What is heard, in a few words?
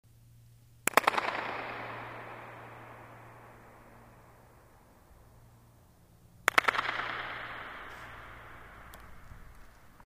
claps centre; Trevor Cox; SoundBook